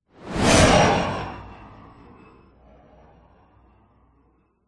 science fiction spacecraft fighter pass fast whizz by; zapsplat